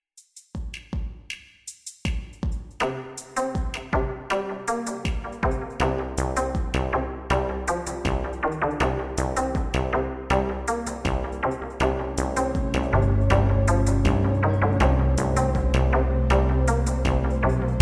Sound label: Rain Grooves